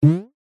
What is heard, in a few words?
cartoon twang boing spring jump plucked; zapsplat